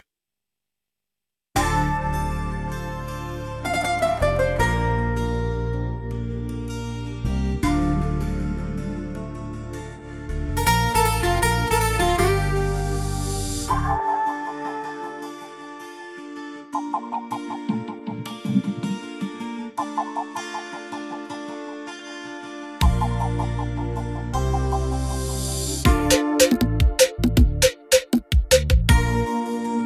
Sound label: Instrumental